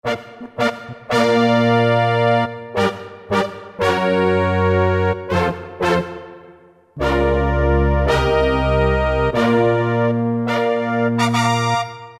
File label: Powerful Brass; Roland PN-D50-03